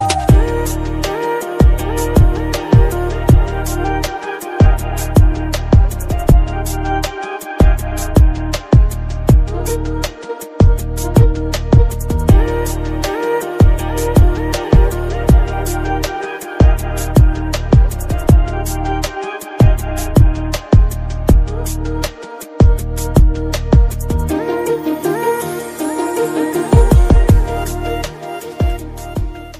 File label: Instrumental Ringtone